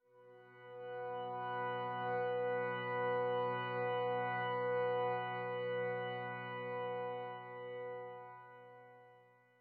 Church Organ; Grindstone

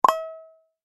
Mine; Google Snake; Minesweeper

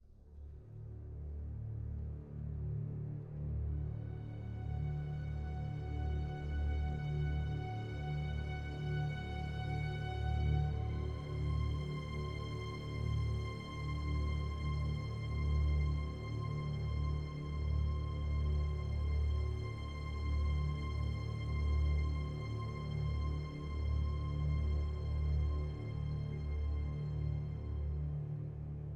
Sandstorm Base Layer 68varbpm 4-4meter; Planet of Lana

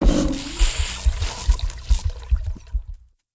Power Up; Caffiend

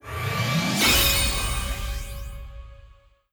Player Rankup

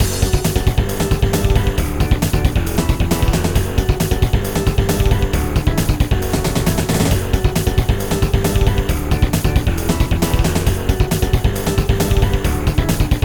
Battle Eb; deltatraveler; android

